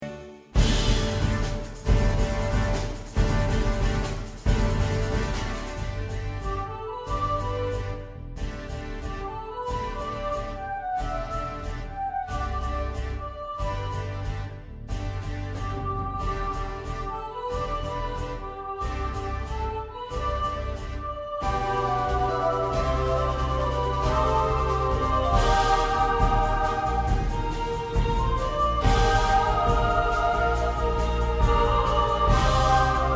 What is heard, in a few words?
instrumental with piano